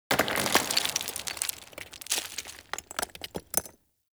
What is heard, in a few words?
Stone Crumble; Ambience; Dagath; Warframe; Clan Dojo